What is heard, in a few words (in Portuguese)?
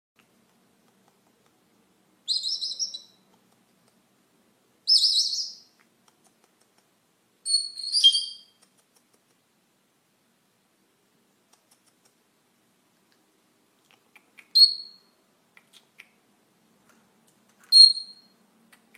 Canto do papagaio do senegal